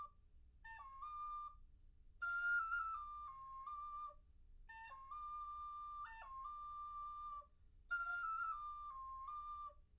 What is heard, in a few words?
nodrums liverec; SiSEC11; underdetermined